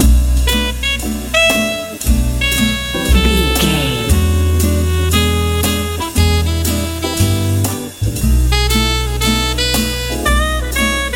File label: jazz